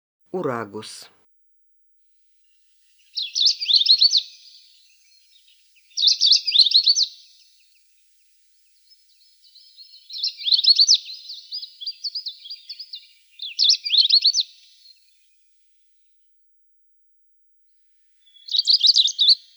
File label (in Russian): dlinnohvostyy snegir golos